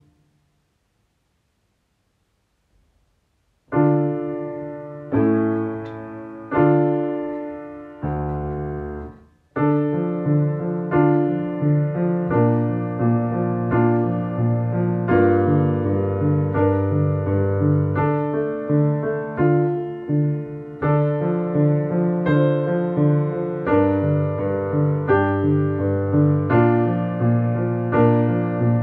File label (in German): Klavier; Auf und Ab